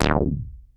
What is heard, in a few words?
ARP 2600 D2, synthesizer; Hollywood Edge - Giorgio Moroder Rare Synthesizer Collection